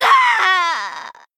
Female Goblin Death

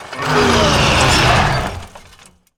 Bull Dozer Death

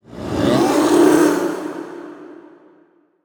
Mana Resevoir Death

